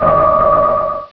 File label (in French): Cri